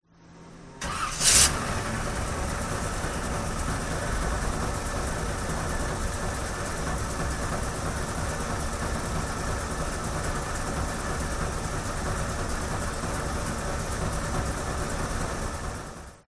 TRANSPORTATION VINTAGE CAR Chevrolet 57 Start Engine